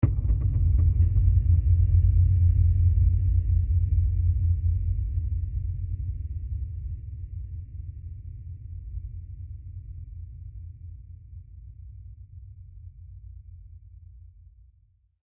Drum Hit Tonal Impact Accent Boom Deep Thud; Trailer SciFi; Horror Sound Design

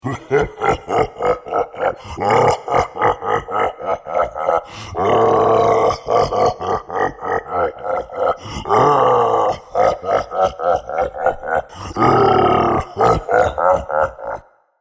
Monster Laugh, Sinister evil monster laughs.; Evil Laughs (Sound Effects:Horror)

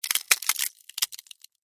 Ice Crack Movement, Scifi; Science, Fiction

